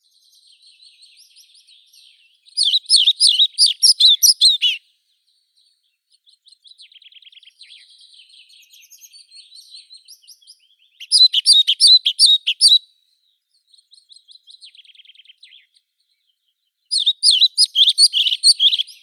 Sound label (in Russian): muholovka pestrushka ficedula hypoleuca